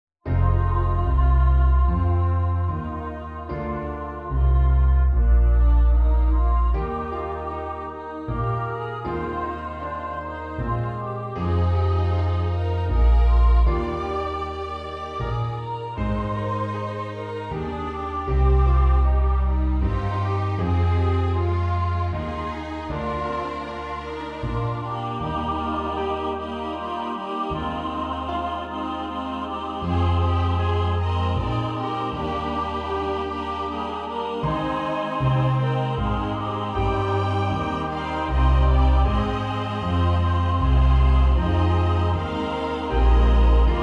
Non Vocal

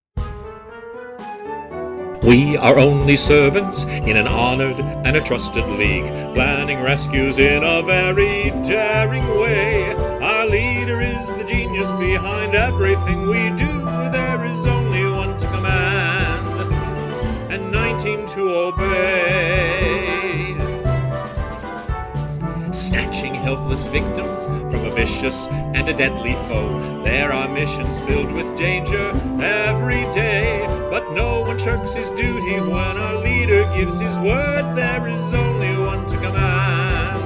Vocal

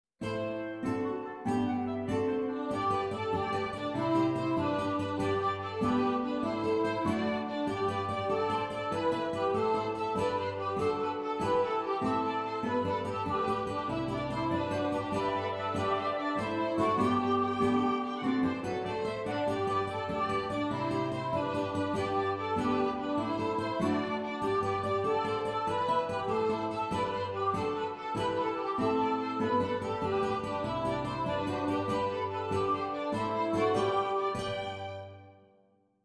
ORCH; EnRoulentMaBoule2

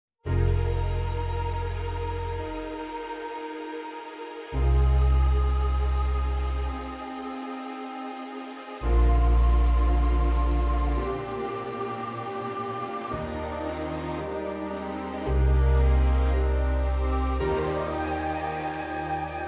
Underscore and Scene Change

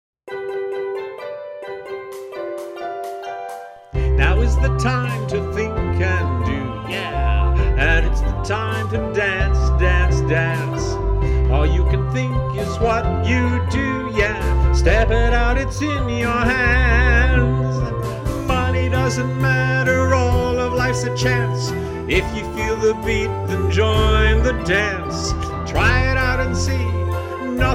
Calypso VOCAL

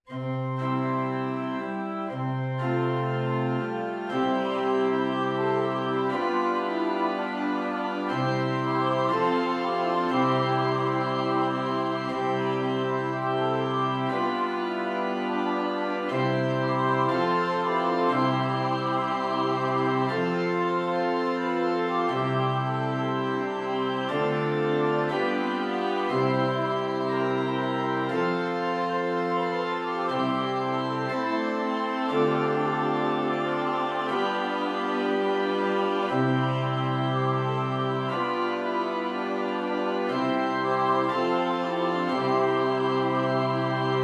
Non Vocal

